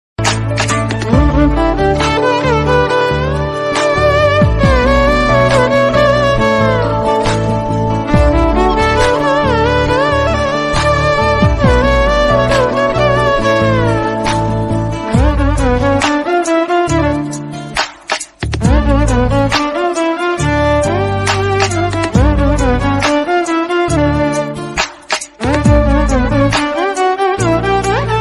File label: flute cover song tamil songs love songs